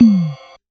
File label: Rhythm Tom Ride, Realistic Concertmate-670; Rhythm Layered Kit